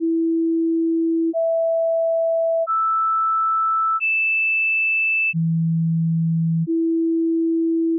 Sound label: octaves